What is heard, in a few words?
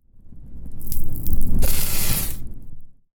fireball beam; psfx; 3rd level spells